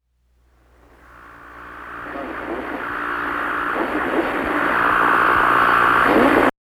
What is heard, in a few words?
Static Intro